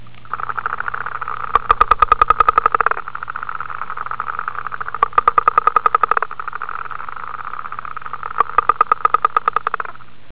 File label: guttural toad frog